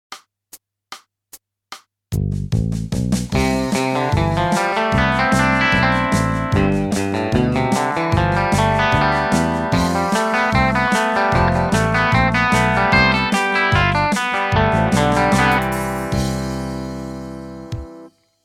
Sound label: country